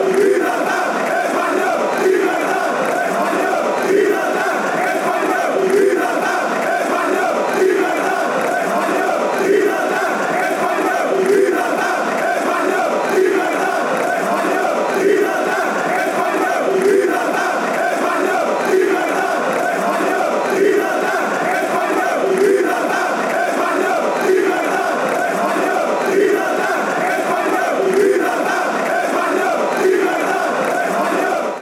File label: spanish freedom fanchants